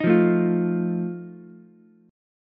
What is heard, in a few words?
E flat Suspended 2nd Flat 5th, Ukulele; strummed; chord; JGuitar